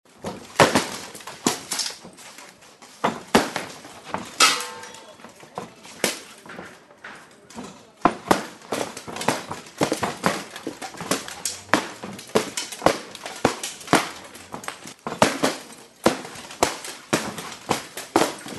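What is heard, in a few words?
sounds of people fighting and fist punches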